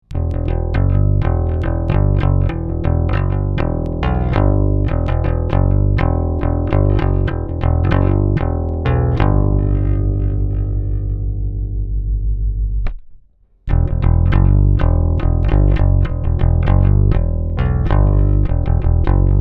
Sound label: Camden Bass normalisiert